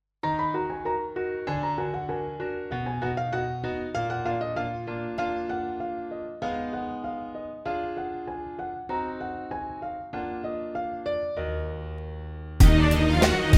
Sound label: ARABIC GAME SONG, e-learning